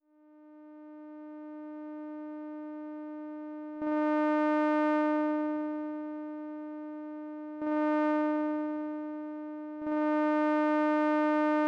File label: parkinson, tremor; spectral features